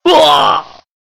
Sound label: Monster Death; fdfish